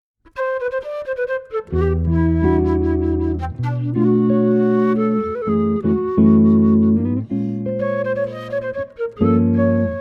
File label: JAZZ À LA FLUTE